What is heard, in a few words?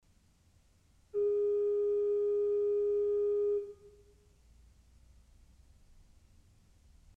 Principal 4' GIS, pipes, ORGEL